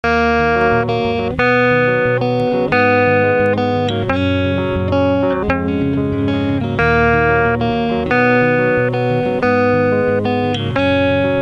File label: acoustic, guitar